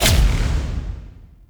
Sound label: Pod Shotgun Proj Die